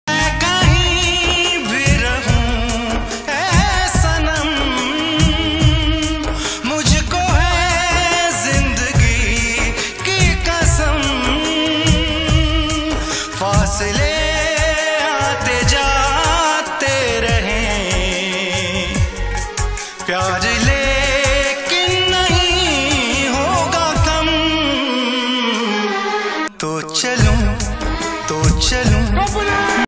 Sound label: hindi ringtone